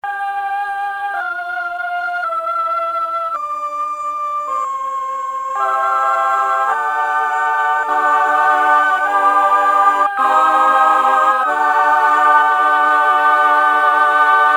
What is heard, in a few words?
female choir